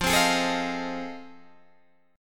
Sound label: F 7th Sharp 9th, Guitar, Open D